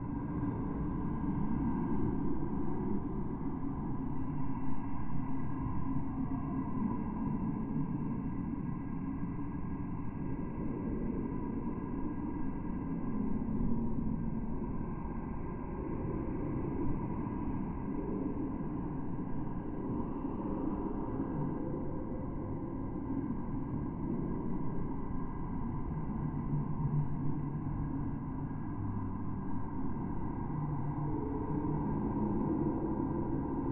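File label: ambience room anxiety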